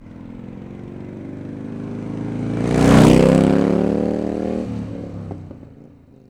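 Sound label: zvuki motocikla, motorcycle sounds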